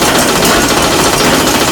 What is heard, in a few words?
Podium Loop; moul